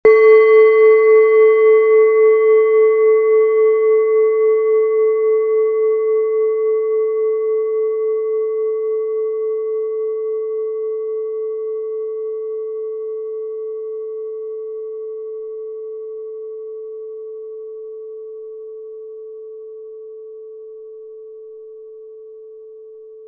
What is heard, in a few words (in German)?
klangschale messing; klangschalen